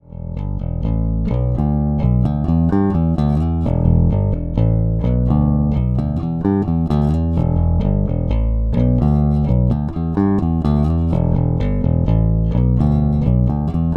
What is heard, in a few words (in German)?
MB 4 SBK Deluxe Series Beide Spulen Plektrum; Harley Benton